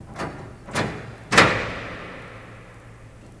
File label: Door Latch Hvy Duty